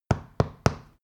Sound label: stone knock wall